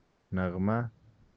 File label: melody